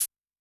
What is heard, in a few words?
Shake A, Yamaha DTXpress-II, Perc